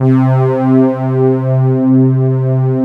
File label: LUSH BED